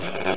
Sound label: Hardware sound; Kaleidoscope